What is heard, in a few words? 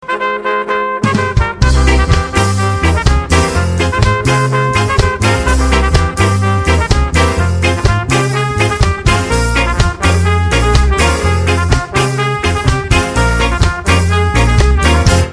reggae